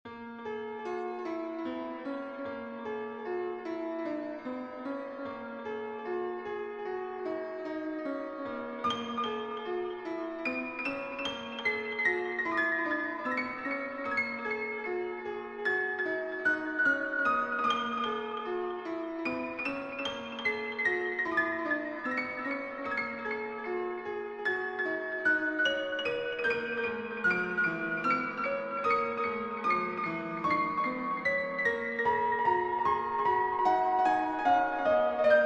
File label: Microtonal Miniature in Bb